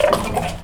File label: DOG; 3D Sound - 3D Keys VOL-1; MEAN 3D